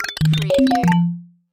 Mallets Melody

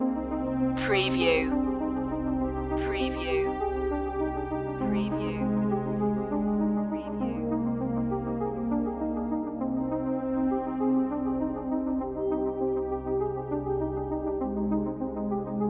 ion rise loop

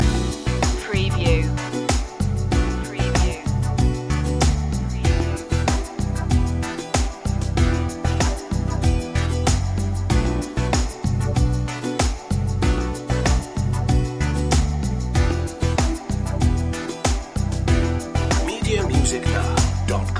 love rhythm loop

